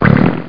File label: wet fart; HotLeather2